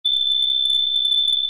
ringtone; Low Blow

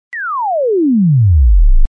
call; Low Blow

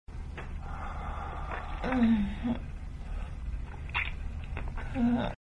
suck, moans; The Witcher Hunt

